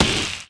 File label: Melee Magical Light; Kilta